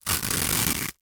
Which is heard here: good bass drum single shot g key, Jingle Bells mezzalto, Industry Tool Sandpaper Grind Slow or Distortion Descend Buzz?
Industry Tool Sandpaper Grind Slow